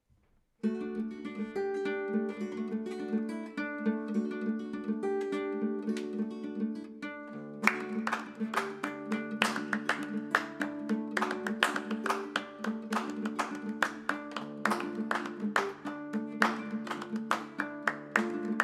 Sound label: African hare music